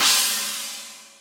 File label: china, virtual drum sounds